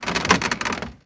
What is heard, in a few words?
foot wood creak, footsteps